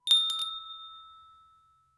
gentle bell ring soft chi

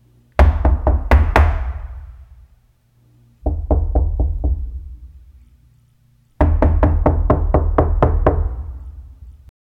knocking on a wooden door, hollow

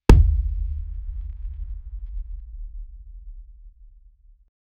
smooth heavy punch impact; thump

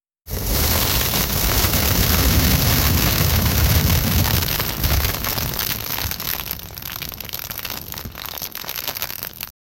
crash falling from a tree

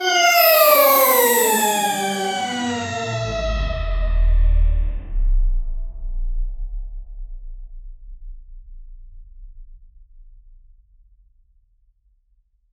game sound effects, item

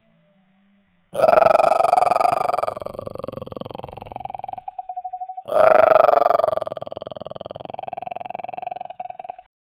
growling mutated dog; gross

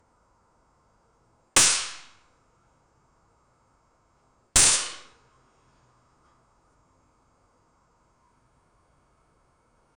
glock 19 real shot, pistol

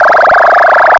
short energetic sound, rise

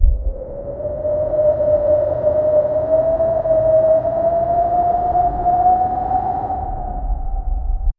winter wind, howling

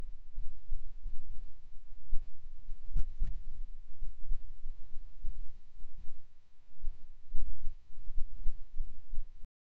the sound of rushing wind; flapping

